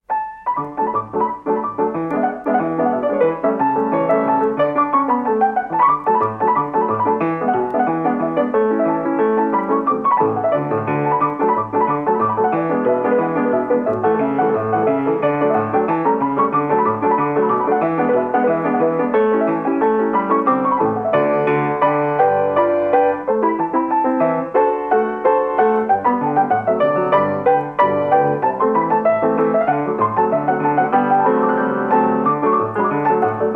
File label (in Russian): taper muzyka dlya nemogo kino